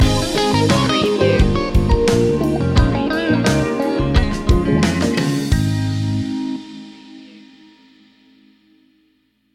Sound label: funky love stinger